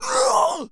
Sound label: Zombies Male Jump; zps; Male1